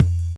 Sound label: Drum & Bass